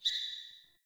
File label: Sparrow Death State Single Chirp; Animals